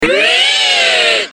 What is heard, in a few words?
Wryyyyyy